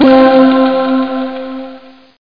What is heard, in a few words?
clear clear